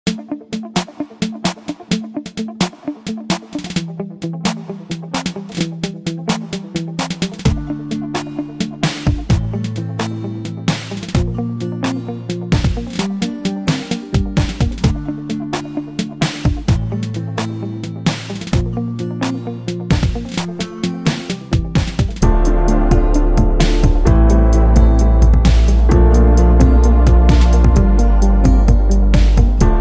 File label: Instrumental Marching